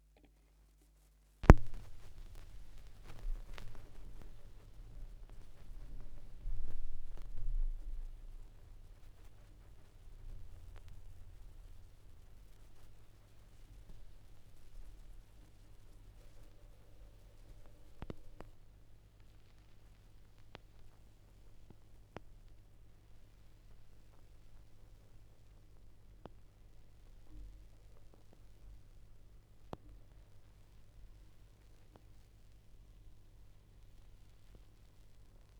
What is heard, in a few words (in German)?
schallplattengeraeusche, Vinyl